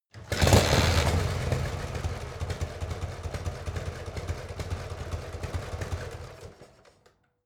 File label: motorcycle harley engine kickstarter on off